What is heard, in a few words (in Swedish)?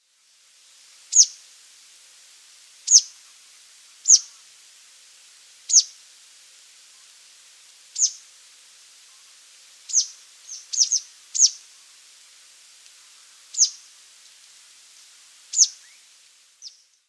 citronarla